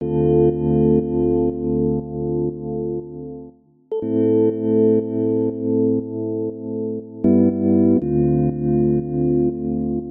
Serum Rhodes

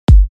Kick 49Hz G; Green Cycle

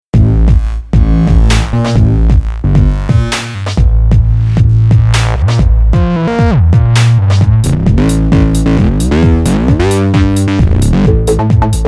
bass mutation

